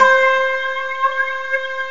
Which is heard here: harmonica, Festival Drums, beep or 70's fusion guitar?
70's fusion guitar